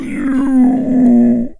Zombie Stabbed; GMTK Game Jam 2020

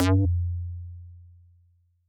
Attack Movement; Super-Robo-Squad

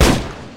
normal shotgun; Gunshots; GMTK Game Jam 2020

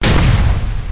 gun sound effect; Gunshots; GMTK Game Jam 2020

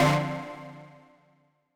Hit Animation Explosion; Super-Robo-Squad

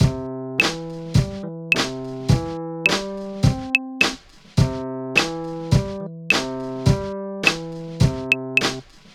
Jam; Home-y Jam

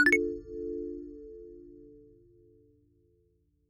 Teleport Noise; Magical-Jumping-Bean

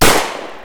40 smith wesson single, Gunshots; GMTKGameJam2020

